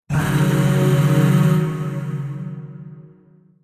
Shawzin Yo Chord D; Warframe; Zariman; Void Shawzin; Chords

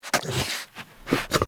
Kubrow Tame Idle Alert; Warframe

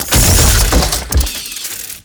Blast Door Open; Ambience; Warframe; TheNewWar; Zariman

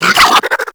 Infested Biped Flip; Warframe

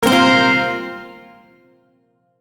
Shawzin Pent Min Chord K; Warframe; Duviri

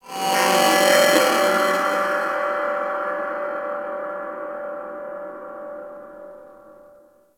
Tech Medium; Warframe; Ambience; Cephalon

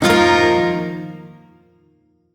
Shawzin Blues Chord; Warframe; Duviri

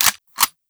Fire Mech 2D, Weapons; Warframe; Bronco

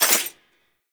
Mech, Rifle; Thanotech; Trumna Prime

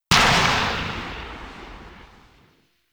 Pistol Charged Tail; Operators; Drifter

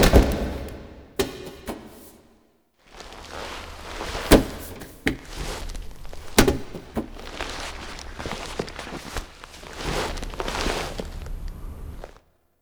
Queen Foley; Warframe; The War Within; Queens Lair Scene Twelve Choice C; Cinematics

